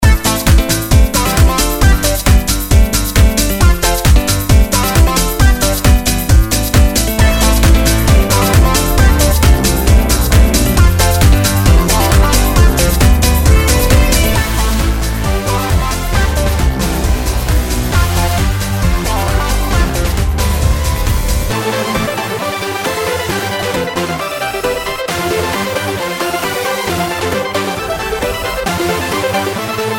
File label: Funky Dub